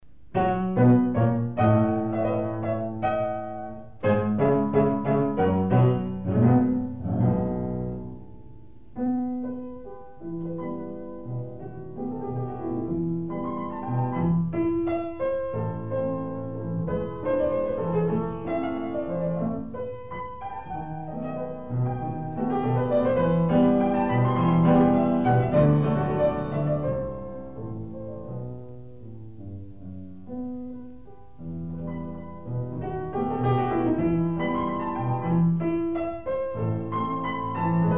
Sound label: piano